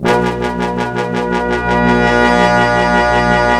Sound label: HOLD BRASS